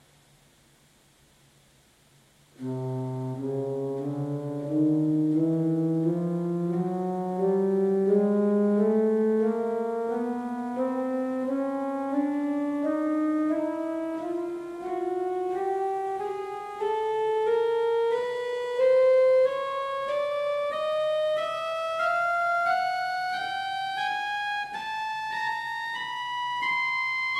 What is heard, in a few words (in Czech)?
Manuál Oktáva 4', Ukázka zvuku, varhan; prospekt; I. Manuál